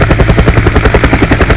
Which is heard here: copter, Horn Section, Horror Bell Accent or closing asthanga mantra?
copter